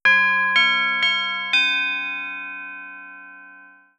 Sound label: chord Glockensp, Deurbellen; 500 serie